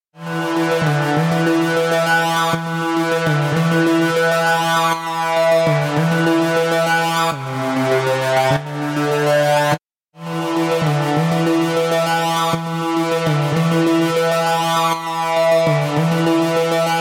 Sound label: Removing Resonances